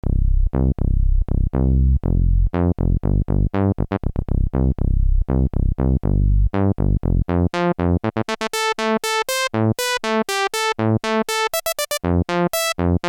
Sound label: yamaha tg77 filter demo lpf